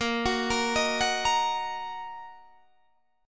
A sharp Suspended 4th Sharp 5th A sharp down Guitar Standard; arpeggiated; JGuitar